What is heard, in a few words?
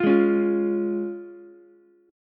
F Minor Sharp 5th F down Ukulele; Fm#5 strummed; JGuitar